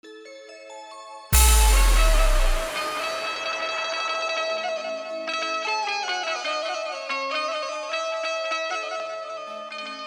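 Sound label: Ganesh Vandana Bhakti Full Dhollki Bass Mix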